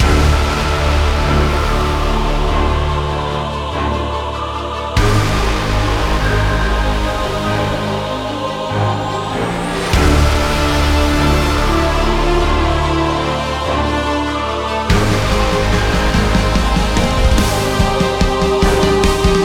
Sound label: BossBattle; Talos